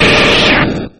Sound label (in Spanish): Grito de Wartortle